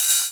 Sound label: Ride; Equipped Music; Loungin House